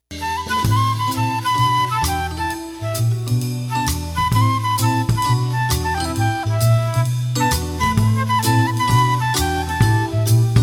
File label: Instrumental Background Music